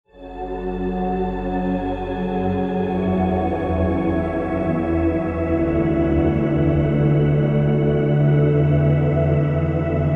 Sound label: Pad, Spire; Routed Sound; Spire Expansion - Star Scavenger Vol.1